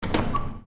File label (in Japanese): doa akeru; easyrpg; Ib